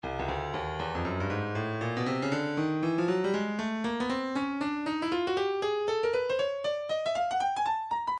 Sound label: breakbeat midi 118 bpm; Swallow C; Stylus Breakbeat Midi Files